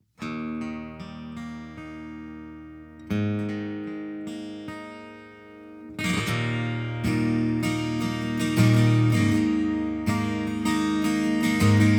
HX STOMP アコギ エフェクトあり

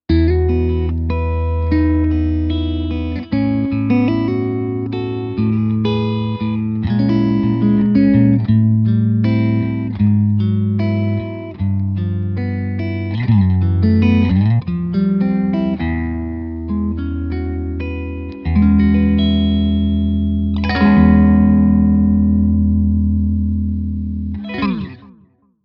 ソロ グレッチ

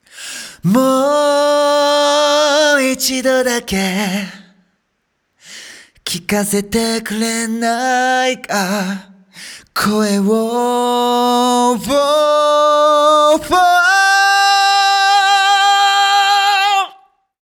声の音

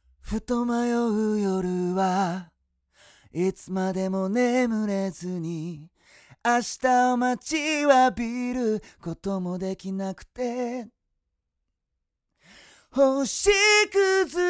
TLM 歌

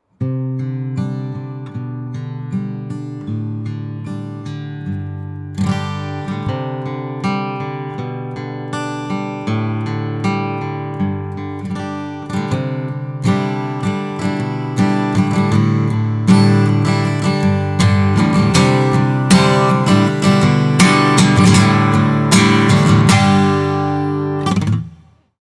rode アコギ エフェクトあり

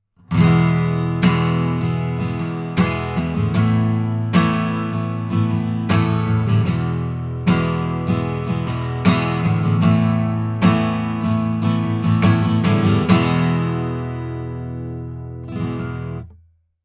ギターストローク; RODE

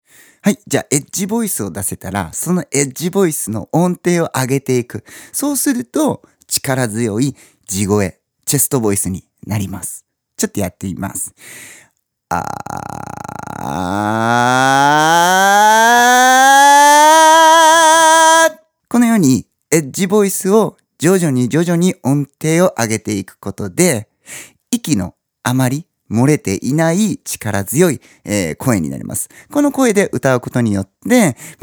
エッジボイス音程

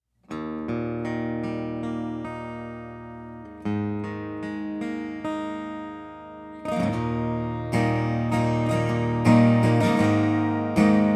アコギ エフェクト無し; HX STOMP

